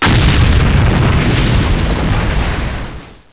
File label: damage; valve; grimtrek